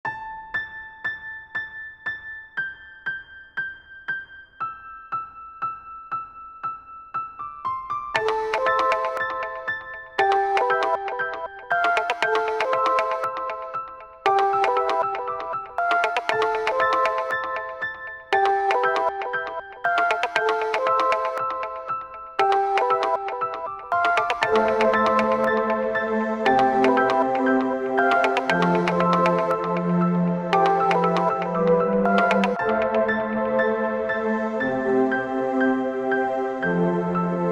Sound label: WW2 podcast intro music